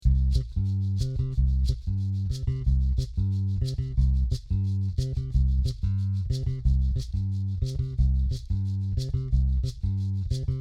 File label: Guaracha